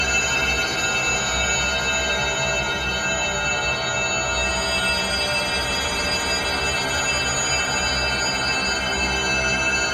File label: levetation; Scrat